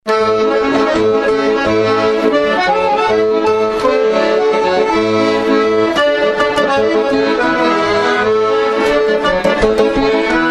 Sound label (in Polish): wściekła polka